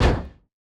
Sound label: Boot Stomp; Nova Sound